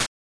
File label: Cursor Move Sound; Game Genie; SNES